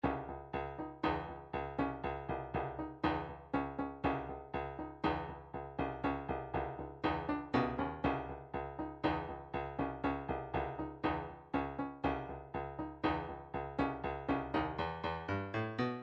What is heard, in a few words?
midi drums